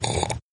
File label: slurp